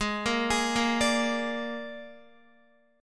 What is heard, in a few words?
G sharp Suspended 2nd Flat 5th, Guitar; G#sus2b5; arpeggiated; JGuitar